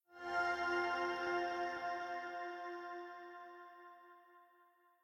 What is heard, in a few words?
Puzzle Completed; solo islands of the heart switch 2019